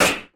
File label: firecracker; smoke